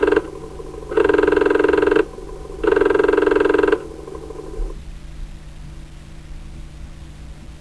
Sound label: disk calibrate, Apple Disk II Noises; A2 LOST N FOUND CLASSICS